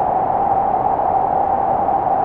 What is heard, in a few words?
ARP2600, modular syn; Sonic Reality; Vintage Timetraveler